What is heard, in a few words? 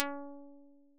string like